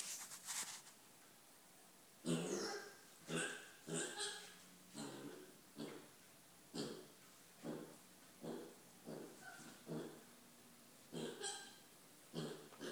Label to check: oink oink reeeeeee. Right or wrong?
Right